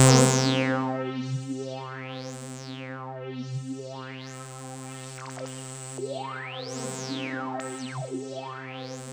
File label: C3, Roland JV 2080, JUNGLE BRASS